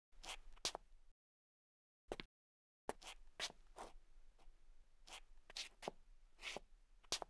kroky kněz; Mafie 1

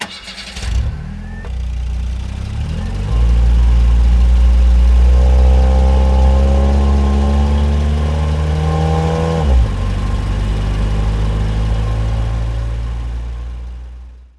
auto rozjezd; Mafie 1

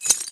sklo krok; Mafie 1

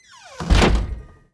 dvere zavreni; Mafie 1

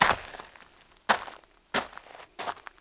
kroky; Mafie 1

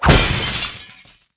sklo rana; Mafie 1

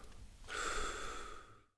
vyfouk; Mafie 1

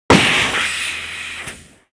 pneu; Mafie 1

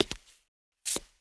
doslap; Mafie 1

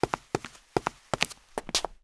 kroky; Mafie 1

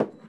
sklenka polozeni; Mafie 1

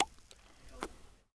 doutníček; Mafie 1

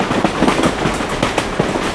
vagonky; Mafie 1

